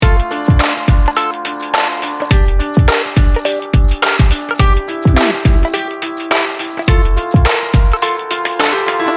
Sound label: urban chill loop